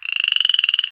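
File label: ambience frog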